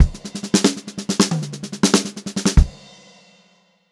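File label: STRAIGHT FILL, Funk-Groove Drumloops; USB Soundscan vol.38; AKAI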